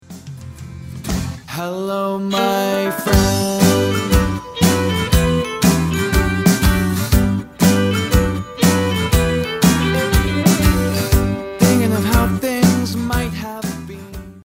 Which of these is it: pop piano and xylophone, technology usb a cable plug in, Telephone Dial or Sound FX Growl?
pop piano and xylophone